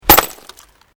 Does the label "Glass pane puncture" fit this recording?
Yes